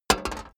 metal hit clank light

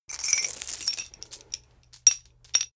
metal chain pull up

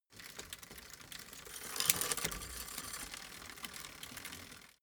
bicycle street upshift

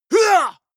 male combat attack short

